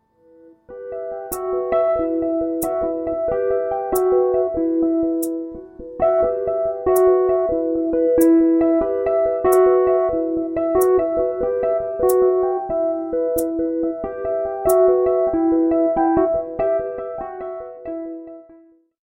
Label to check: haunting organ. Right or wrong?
Right